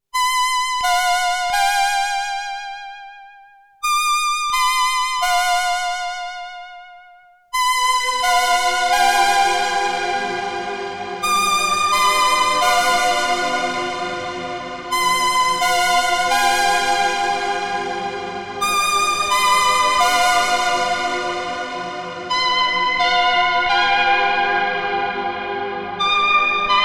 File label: KORG Smooth Lead; KORG Luminance